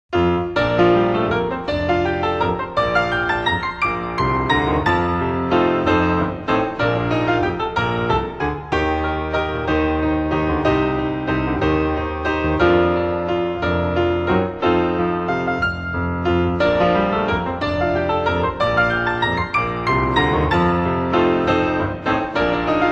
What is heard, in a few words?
Keyboard